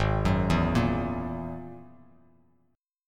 G sharp 7th Suspended 2nd Sharp 5th G sharp, down, Bass Standard; arpeggiated; chord